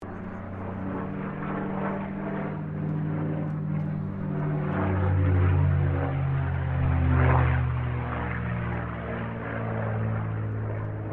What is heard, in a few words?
AIRCRAFT CAC CA-18 MUSTANG MK23 FLYING STEREO, WW2 Mustang fighter plane; Airplanes